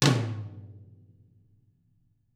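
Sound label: DW 12 flam; DW TOM; ILIO; Double Platinum Drums 1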